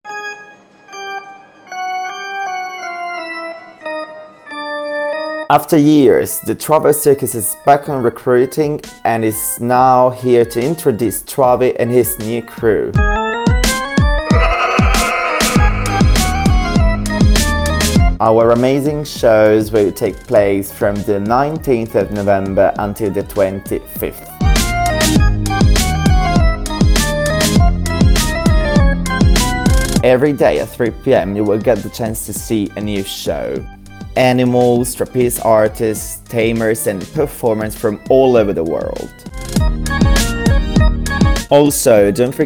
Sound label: Jingle; Travel Circus; EN